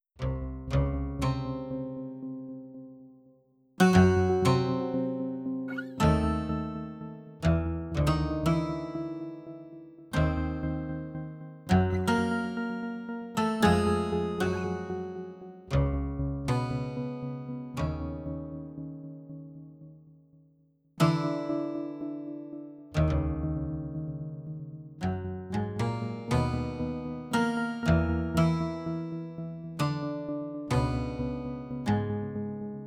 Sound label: guitar